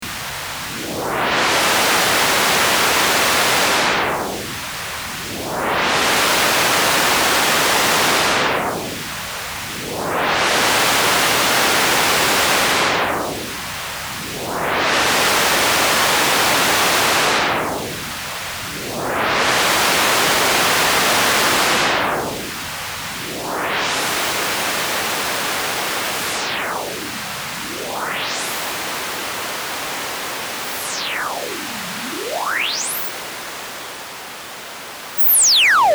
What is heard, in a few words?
Notch Cutoff Sweep Noise